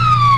Whale; Whale Asterisk

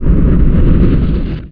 stone start; Grounch

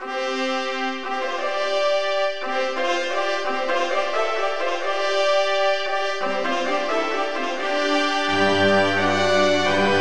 Midi; Castles - Siege and Conquest

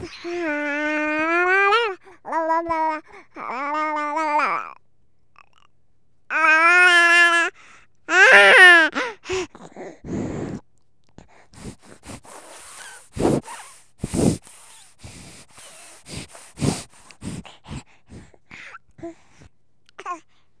BABY TLK

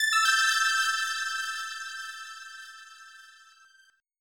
harsh; koan

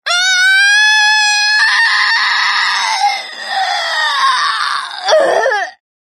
falling; JK2; TAVION

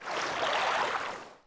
swim stroke; DAoC

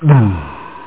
worm death; WormWars

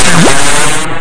whack a boom

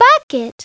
bucket; Treasure Galaxy

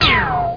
a laser; PC Gamer IT CD 31; ARMRCMD

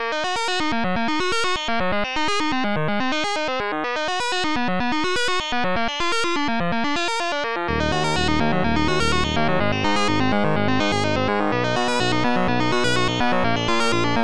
boom bass

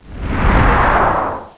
POWER DN; XWINGTIE

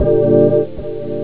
ORGAN; OctaMED SoundStudio V1; synth chords

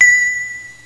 Bliink; Concentroids From Planet X

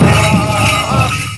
INDIAN3; mtm2demo; PC Gamer 4.2

